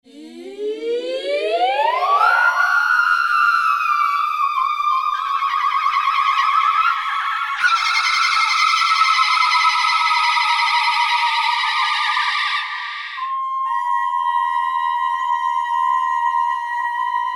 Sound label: space opera